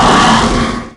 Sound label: Spider Die; Wild West Shootout (Arcade) (gamerip) (2019)